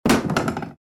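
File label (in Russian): падение ножа на пол; Звук ножа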